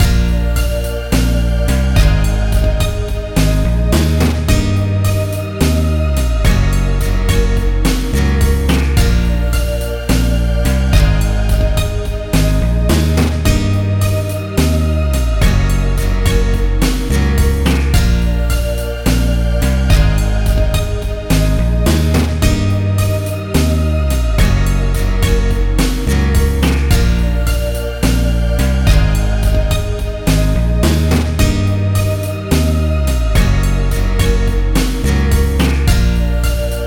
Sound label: Fail Jingle; Cliff Diving; PS Vita